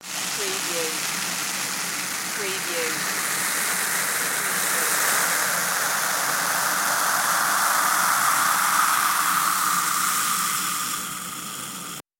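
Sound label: Champagne Fizz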